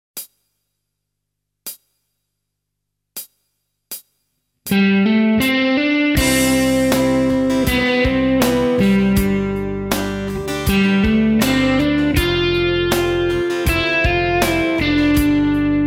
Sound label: Major Scale, guitar